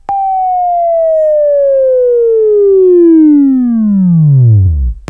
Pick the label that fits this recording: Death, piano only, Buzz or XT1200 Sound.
Buzz